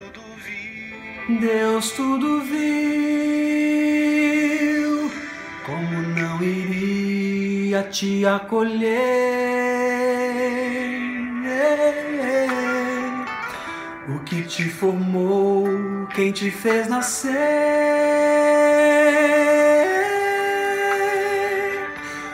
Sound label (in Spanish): Pista tenor